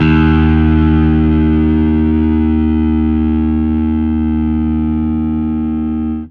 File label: Fuzz D sharp 2; torchlight iii; ps4